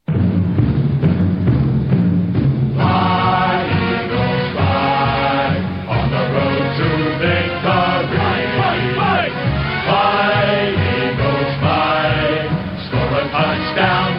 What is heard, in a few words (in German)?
klingelton, fight song